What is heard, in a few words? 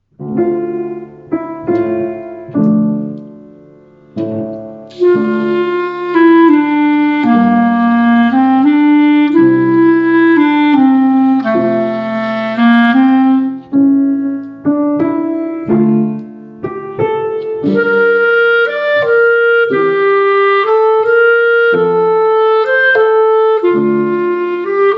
Waltz with Solo